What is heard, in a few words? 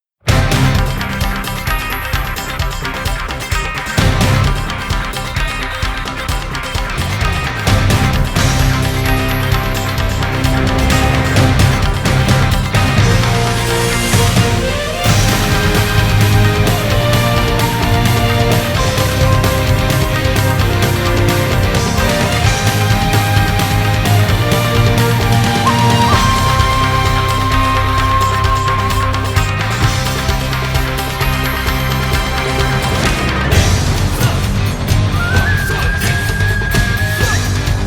bgm